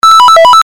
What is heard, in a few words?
join